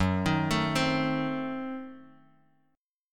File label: F sharp Diminished 7th F sharp Guitar DADGAD